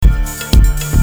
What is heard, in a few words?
depressing rock music